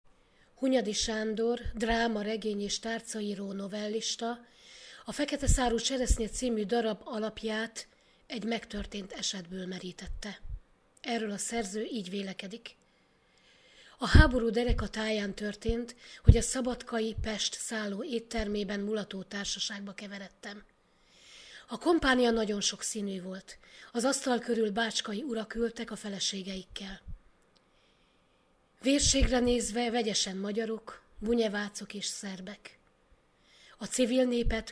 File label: digitar